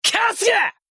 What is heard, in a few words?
attack